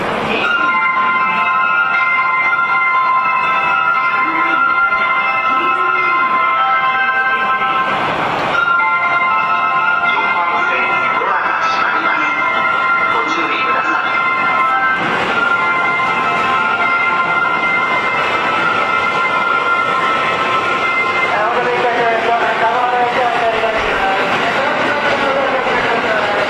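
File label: Departure Melody; 打ち返しています。; 中央線特急 あずさ・かいじ; 甲府・松本方面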